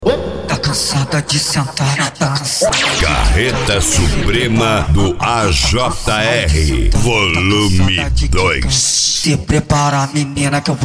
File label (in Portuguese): carreta suprema funk